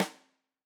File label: Tam Rim, drum